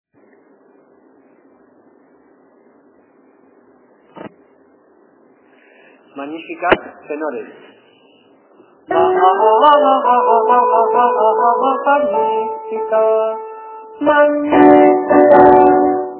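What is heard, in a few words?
tenor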